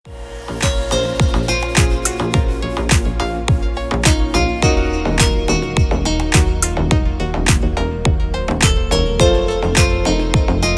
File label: call sound